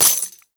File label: Fist Claw Coin Hit; Warframe; Tenno; Incarnon